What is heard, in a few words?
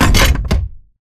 Metal Jog; Titanfall 2